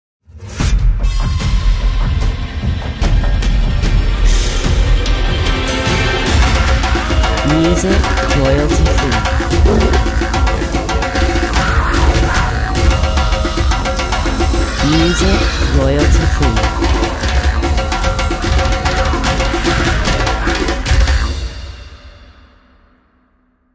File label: Wild jungle